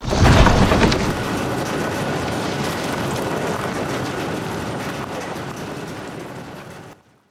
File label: stone door open; svencoop; rngremake